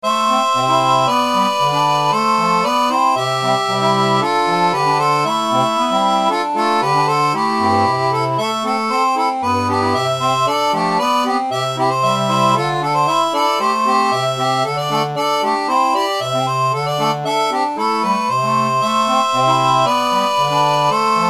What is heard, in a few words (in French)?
seraphone, orgue barbarie